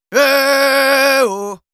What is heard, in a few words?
Yells, Viking Vocal